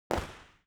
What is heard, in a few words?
archimage projectile